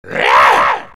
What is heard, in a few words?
knight attack; vo; tf2; garrysmod; halloween boss